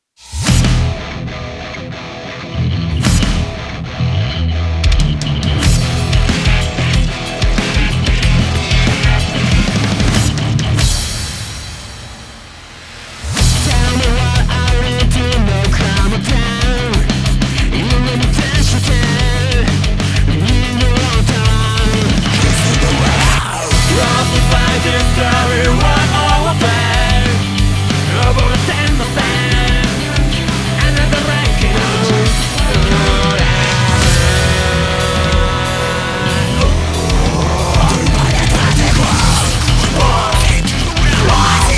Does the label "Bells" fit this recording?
Yes